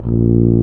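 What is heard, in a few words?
brass, C Tuba; Tubas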